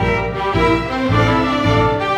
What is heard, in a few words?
Rock-Pop Strings; Orchestral; Sonic Foundry (Sony Creative Software); Orchestral Series VOL-4 - Rock and Pop